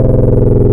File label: Twin CMOS Saw, wavetables; Animoog